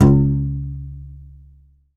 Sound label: C. Bass Balalaika, Plucked Strings